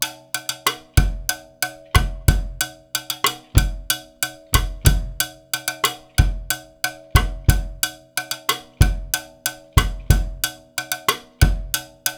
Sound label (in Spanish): bolero 46 timbales bumbo